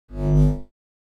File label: Lightsaber sword swinging